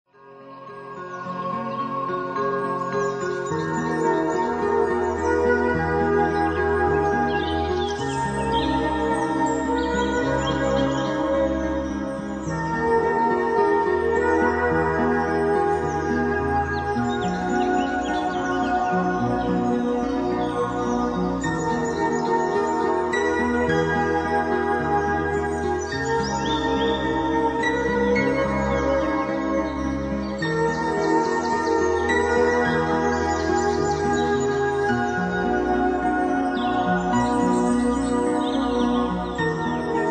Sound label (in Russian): Звуки природы - Тибетская флейта и птицы